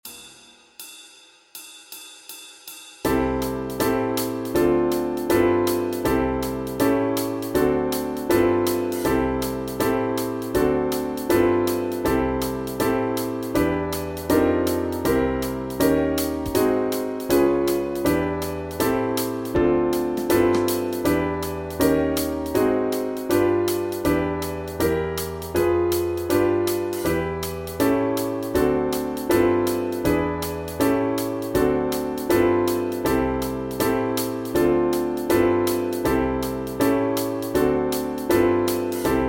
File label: Backing Track, walking bass